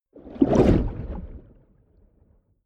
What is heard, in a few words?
whoosh submerged abstract fast short